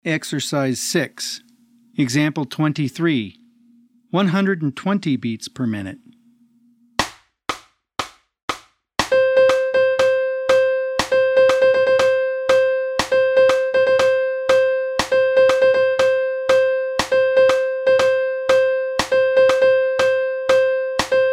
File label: 120 BPM